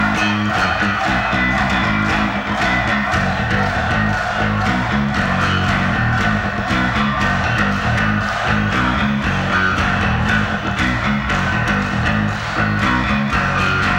Bass Guitar Doodle

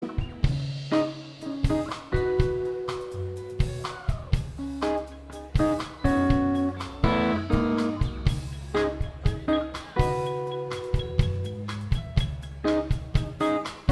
Guitars Drums

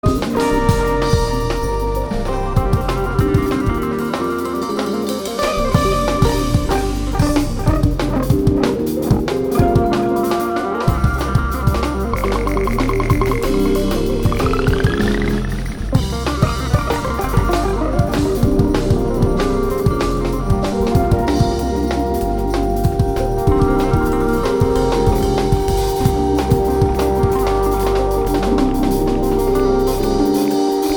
Drum N Bass

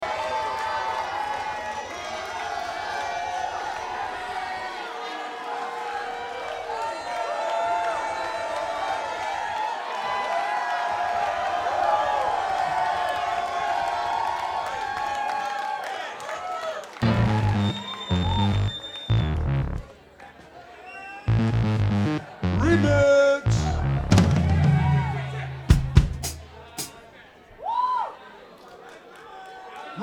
Crowd

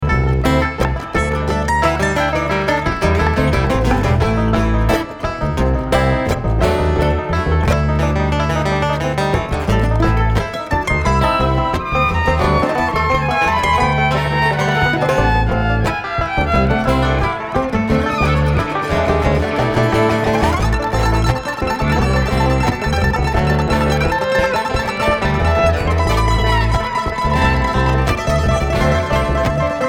Traffic Jam

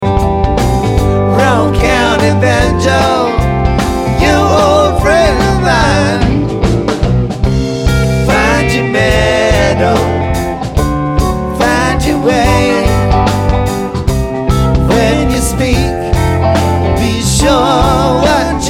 Banjo

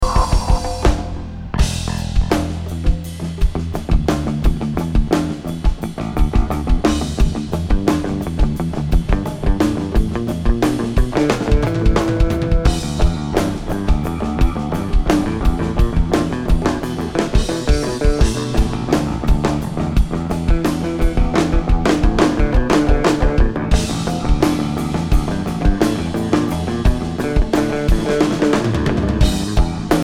Drums and Bass

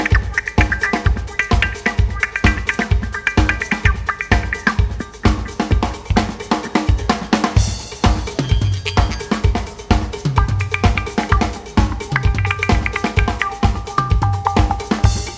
Percussion

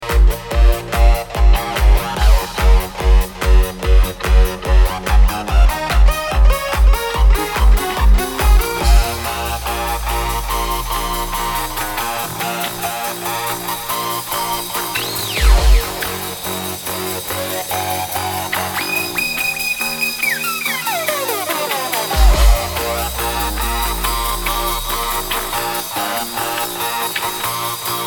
Jam

